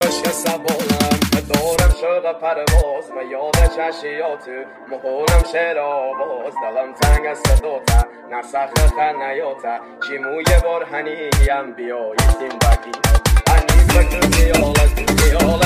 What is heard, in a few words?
remix